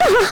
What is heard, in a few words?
Hurt